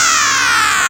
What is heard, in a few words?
Crow; Jungle Warfare 1&2